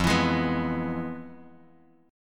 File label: F Minor Major 7th Flat 5th Bass Standard; FmM7b5 strummed; JGuitar